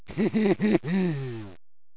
haha

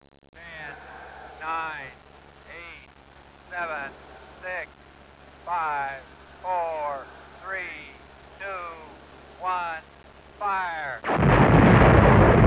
blast

